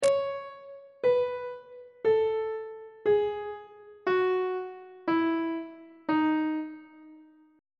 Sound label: d sharp locrian mode descending on treble clef